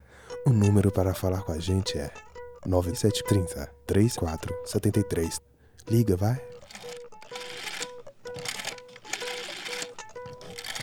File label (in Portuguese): vinheta